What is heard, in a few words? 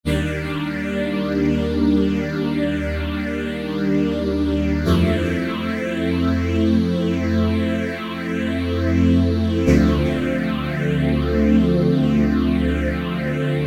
Silk OBX saws